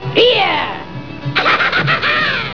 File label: beer